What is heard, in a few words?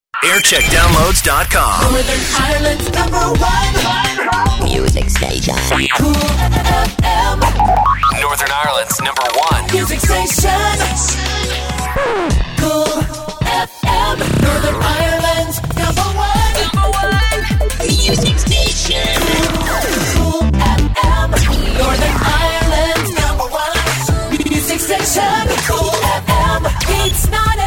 jingle montage; cool fm; 2005